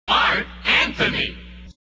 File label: jingles shout; positron